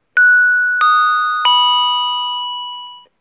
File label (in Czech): melodie